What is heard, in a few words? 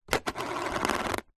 money coins x2 australian 2 dollar coins set down on plastic lid and spin